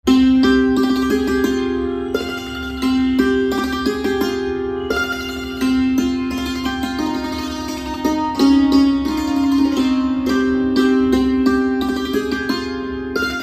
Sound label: Santoor, instrumental ringtones